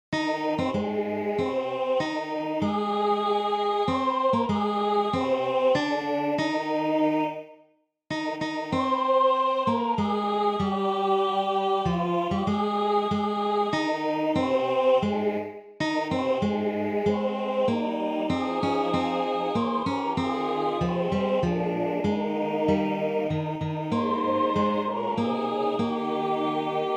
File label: National Anthem Tenor